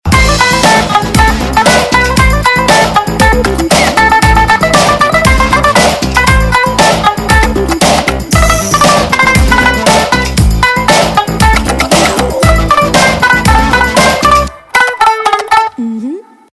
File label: Theme